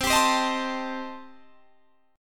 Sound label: A Minor 7th Flat 5th C, chord